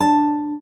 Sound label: Classic Guitar, notifications